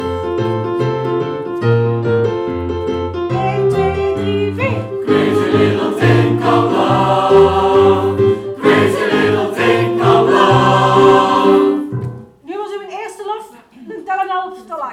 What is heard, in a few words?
SATB